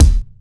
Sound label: clean steel kick drum sample g key, steel kick drum sample tuned to the G note